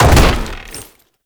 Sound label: Land Heavy Wood, movement; Warframe; Uriel